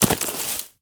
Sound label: Bodyfall Weeds; SFX Kit; Bodyfalls